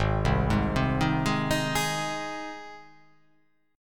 G sharp Major G sharp, chord

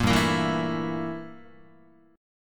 G Minor 6th Add 9th G down Guitar; Gm6add9 strummed; JGuitar

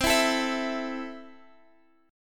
C 6th, Guitar, Drop D

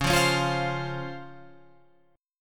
Db Major 7th Db down Guitar Open F; strummed

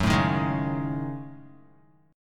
Gb Minor 6th Add 9th Gb down Guitar

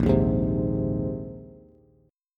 Bb 7th Suspended 2nd Suspended 4th Bb, Bass; Bb7sus2sus4; strummed; 6,6,6,5; JGuitar

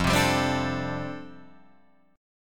F 6th Add 9th F down Guitar Drop D, chord

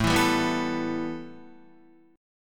A 7th Suspended 2nd A, Guitar; 4,7,7,4,4,5; A7sus2 strummed

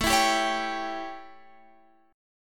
A 7th Guitar Drop D, chord; x,12,14,12,14,15

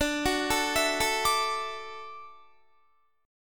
D-Major Add 9th D down Guitar; arpeggiated; JGuitar

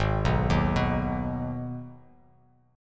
G sharp Minor, chord; 4,2,1,4,x,x,x,x

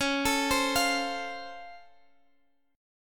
C sharp Augmented Major 7th, Guitar, Standard; arpeggiated; capo 3; x,x,11,14,13,13

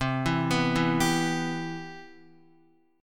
C Major 7th, Guitar

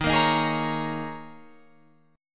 D sharp 7th Suspended 4th, Guitar, Open F

